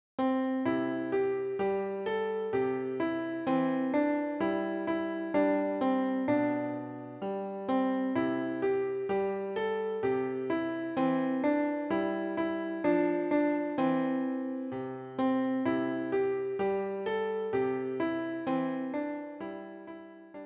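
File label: piano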